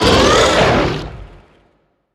beast vocal surface growl

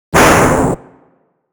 black hole shooting; dogma

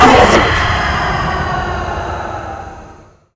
mom dash; mothershadow

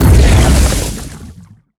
blood laser strong

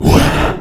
ghost shoot

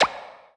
slipped rib deflect

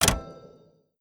stop, slot; greed; slot eyes

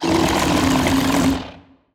suckin; mega fatty

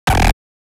jacobs ladder laser; dogma

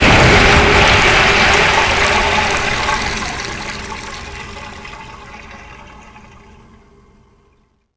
pipes turnon; boss2intro

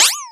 band aid pick up

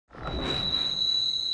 light ball throw; dogma

